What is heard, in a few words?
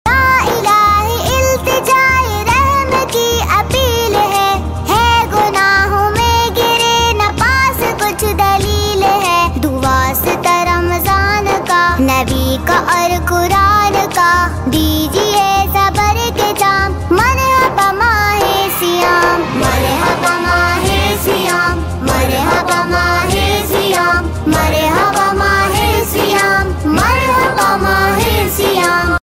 Kids Dua, islamic ringtone